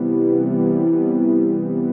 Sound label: house synth 125 A, keys